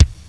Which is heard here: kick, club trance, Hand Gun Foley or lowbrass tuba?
kick